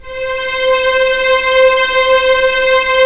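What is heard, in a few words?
STR SC, strings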